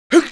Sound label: damage, pain; mohaa; dialogue